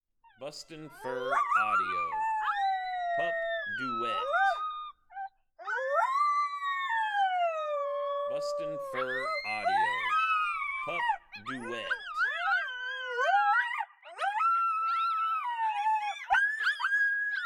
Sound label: Pup Duet